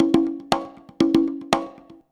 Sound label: CONGA 119, Modern Percussion Loops